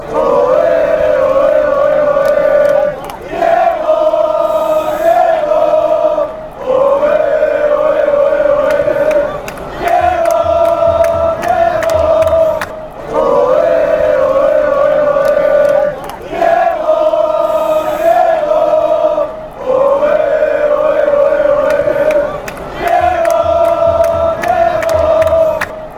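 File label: fanchants, football chants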